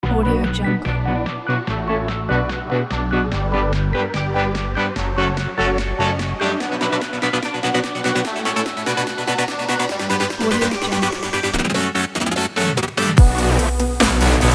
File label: future bass